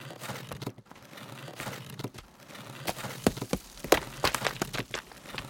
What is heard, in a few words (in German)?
Schleifen uber Stein, Die Kiste schleift hierbei über ein Steinpflaster; Bewege die Kiste von der Druckplatte